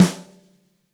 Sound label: Gretsch Snare Room; 1967 Gretsch Kit; One-Shots; Sonic Foundry (Sony Creative Software); Vital Drums